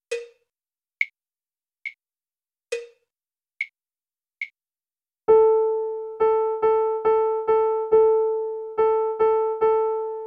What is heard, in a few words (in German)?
6-8 Takt